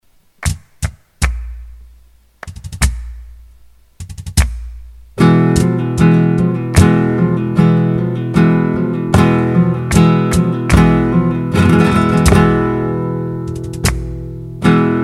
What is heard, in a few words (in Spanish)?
Soleares llamada